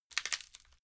wood crack slow

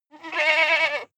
goat baa two

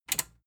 metal button tiny click enable fast

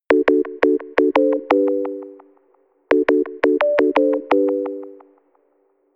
notification call

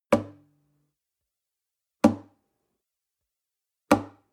nylon guitar drum single high

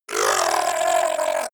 fishman shout extreme pain

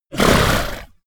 horse vocal snort

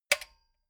metal button stereo spring click fast

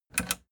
plastic button latch click disable fast

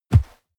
horse step snow

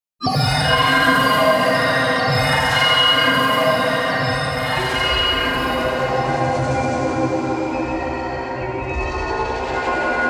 shrill drone

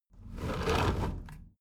window roller shutter close soft single pull

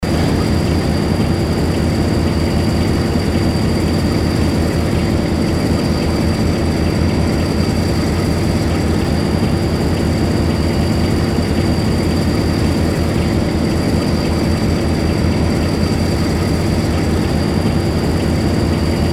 train engine

